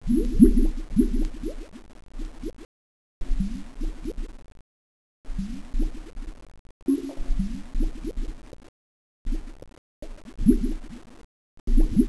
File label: blub